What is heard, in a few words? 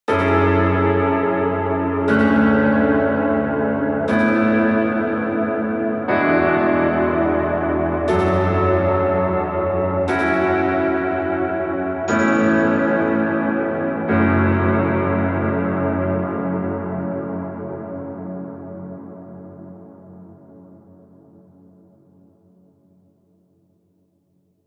F Minor Jazzy Ambience; pad